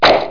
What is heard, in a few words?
pill pop; JOHNNY